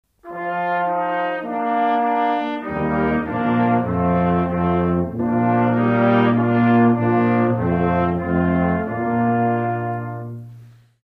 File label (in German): Vorspiel